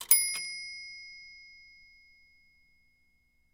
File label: Typewriter Margin; typewriters